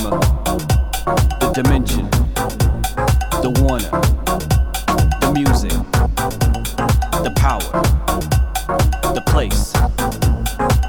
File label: Vocal